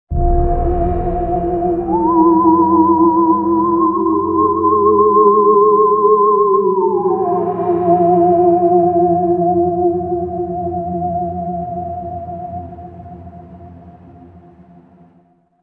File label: Theremin; Nefarious